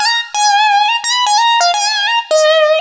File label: lead (funky riff); Sonic Foundry (Sony Creative Software); Ugly Remnants VOL-2; Leads